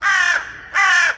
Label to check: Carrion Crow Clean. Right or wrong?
Right